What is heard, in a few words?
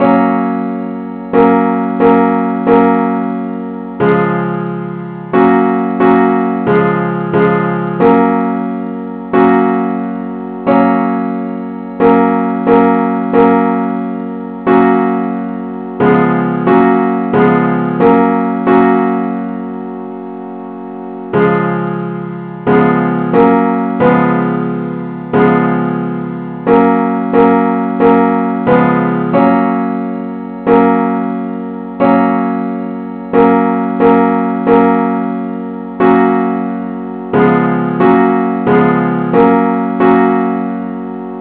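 simple acc key F sharp; henley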